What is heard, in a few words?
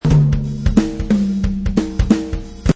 Jazz toms drum loop at 180 bpm.; Jazz Toms Groove Loop; Drum Loops